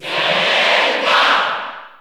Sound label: Cheer Italian; Zelda; SSB4; SSBU